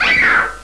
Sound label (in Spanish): GRITO; RPG Maker; Legend of Heroen v1.20